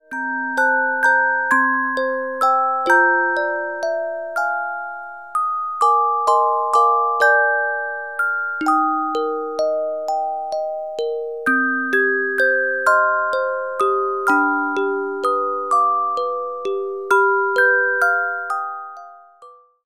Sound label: Carillon Music Box Melodies